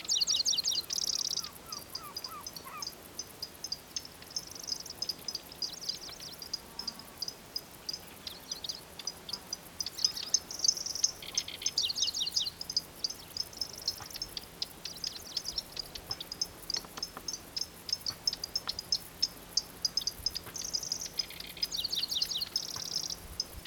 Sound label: locustella certhiola